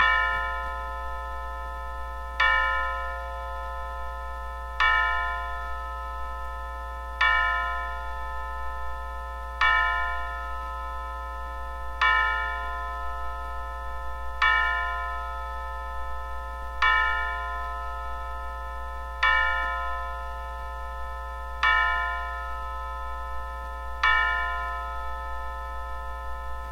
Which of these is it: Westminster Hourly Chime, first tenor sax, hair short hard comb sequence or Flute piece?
Westminster Hourly Chime